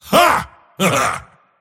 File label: mars laugh; dota